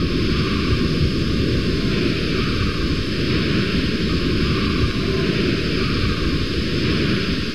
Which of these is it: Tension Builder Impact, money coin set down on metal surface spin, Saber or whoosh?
whoosh